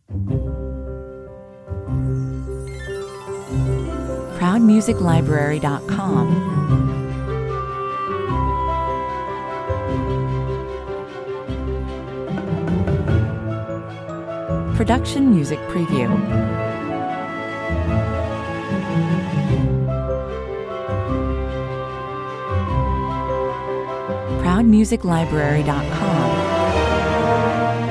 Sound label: think positive 150 bpm